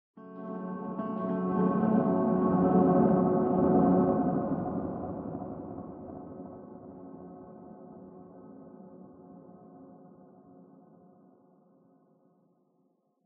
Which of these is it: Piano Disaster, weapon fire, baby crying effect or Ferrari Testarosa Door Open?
Piano Disaster